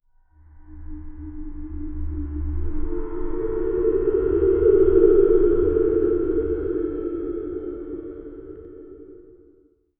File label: heavenly hatch opening, wooden creak